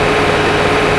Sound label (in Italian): Veicolo, motori